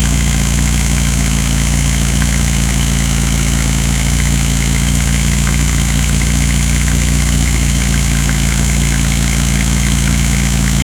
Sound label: m54b30 engine revving up; automotive